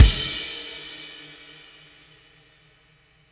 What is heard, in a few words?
Drums Ending, Indie Rock Anthems; Sonic Foundry (Sony Creative Software); Session 04 - Dubwyzz